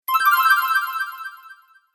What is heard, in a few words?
Bright Fantasy Spell, magic; Magic Sound Effects